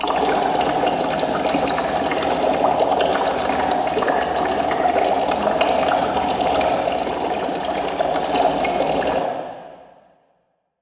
Stew Bubbling; Prison Architect

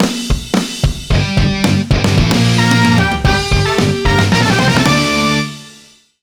Touchdown; NFL Rush Zone; Leapster Explorer

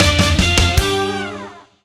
Ui Unlock dialog; talking tom gold run

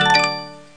Stove Serve; Nightclub Story; android

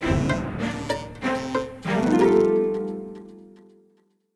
Lose game stinger

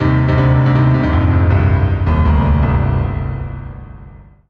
Solo Piano Negative; MUSIC EFFECT; startide

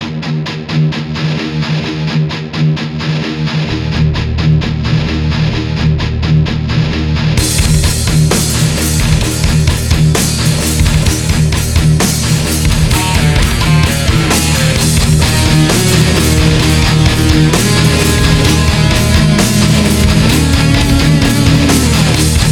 Heavy Riff; Dual Blade: Battle of the Female Ninja

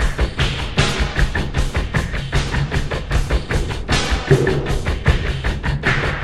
Lake beat

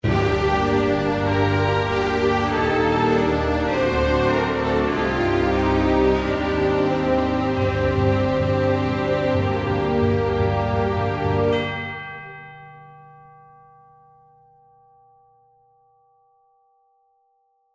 Gameover

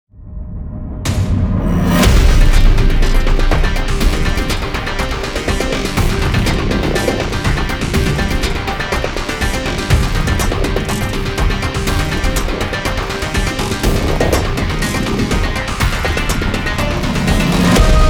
Main Menu BGM; mad crown

